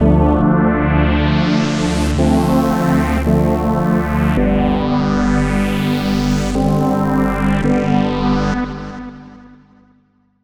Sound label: Techno Pad; Pads; Dr. Fink's Funk Factory